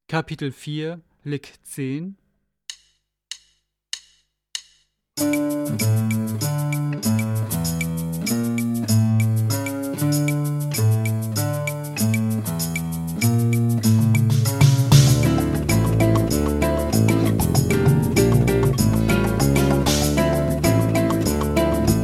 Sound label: Latin Style Guitar